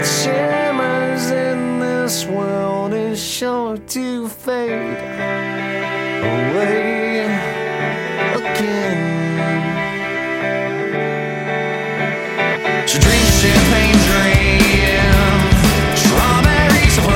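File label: samsung Shimmer tone, ringtone; zange samsung makhsose hamye goshihaye samsung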